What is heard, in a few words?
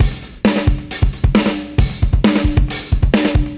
fx, Beat Loops, 134; Zero-G; Monster Beats